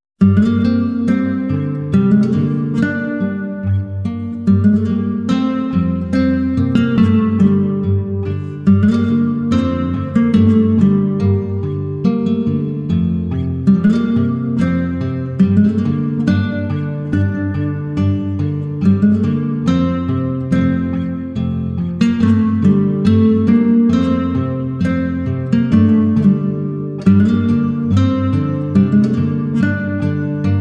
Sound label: SPACE ACOUSTIC GUITAR